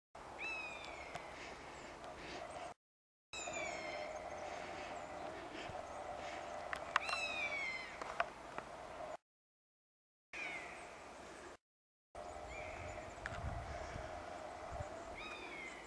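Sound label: honey buzzard 2nd juvenile duet, common buzzard adult; hexham w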